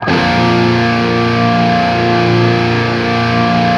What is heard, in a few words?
open A# loop, guitar; East West; Steve Stevens Guitar Samples Collection; OP CHRD LOOP